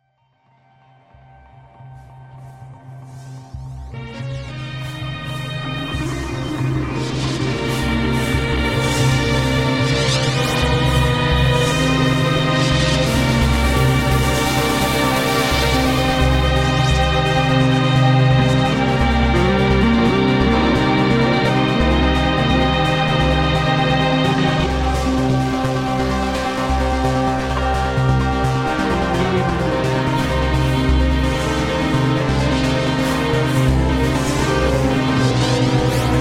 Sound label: Arpeg; ArpegTwo